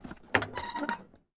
Open A Treasure; LOOPERS

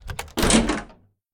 Open A Door Room; LOOPERS

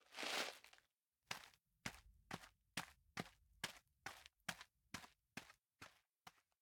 Look Back; LOOPERS

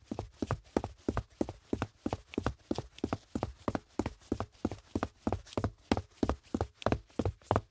Footsteps Boots; LOOPERS

